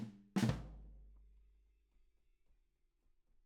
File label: Ride